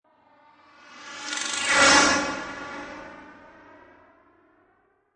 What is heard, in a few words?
Laser Whoosh Rising; Scifi Production Elements, Set 1; Lynne Music